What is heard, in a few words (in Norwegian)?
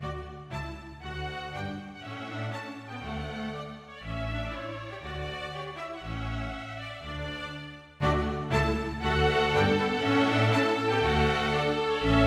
Gavott for strykekvintett; Hertz